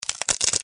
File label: ice; paoPaoLong